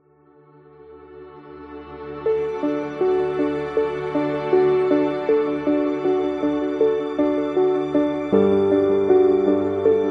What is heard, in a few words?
Piano Inspiration; epic music vol 3